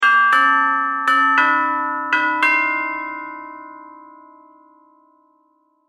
Musical Bells Chime Christmas Joy To The World; Musical Sound Design; Production Element

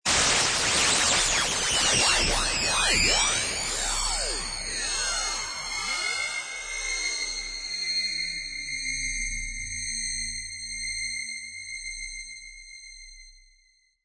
Elctrc Insnity, Insane electric noises; Strange Tone Sound Effects; Strange Tones (Sound Effects:Sound Design)

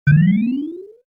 Rise up, Rise Up SFX, Short; Miscellaneous Toy; Toys

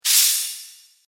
Faint Metal Swish

